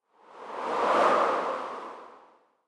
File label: Wind